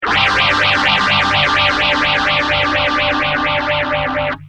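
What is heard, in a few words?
guitar phase II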